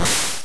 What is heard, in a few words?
Air Wheel; MMBNMMOP